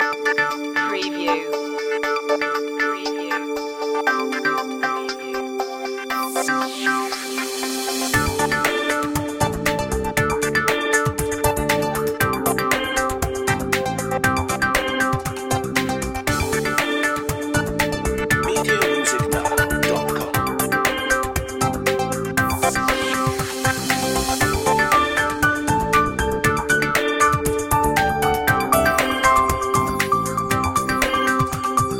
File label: informative board